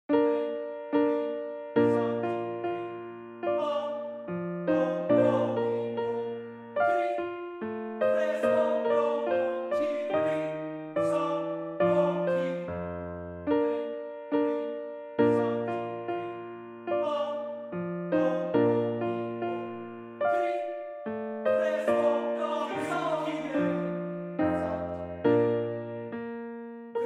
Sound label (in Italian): Lied per voce e pianoforte; Rosetti; Abschied an Theonen